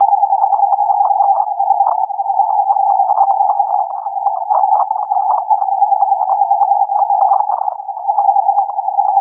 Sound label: 25 kHz; 25.1KHz; Beta Timecode 1 (RUS)